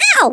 pain; quake2; coop; Bomberman

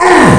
pain; quake2; coop

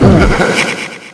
fall; quake2; coop; Hueteotl

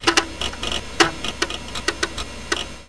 cd noise; quake2; mnhcoop

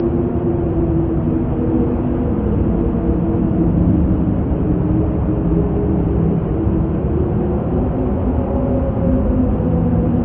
wind; quake2; mnhcoop; ambient

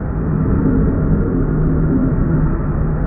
wind; quake2; redux

